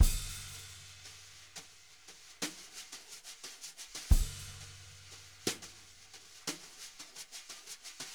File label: jazz brush loops